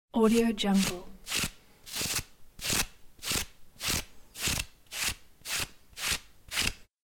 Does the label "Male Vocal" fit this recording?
No